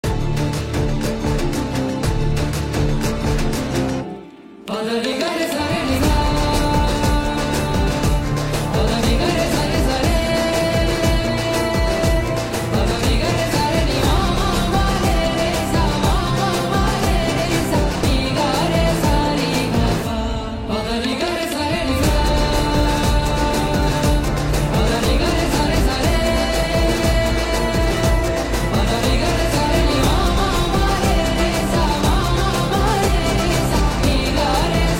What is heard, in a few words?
ringtone tamil melody; dc movie